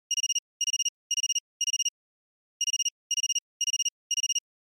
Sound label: Feature Phone Ringtone High